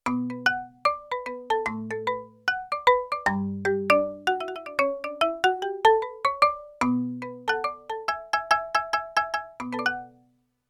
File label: Octave Unison, Marimba, Yamaha TX16W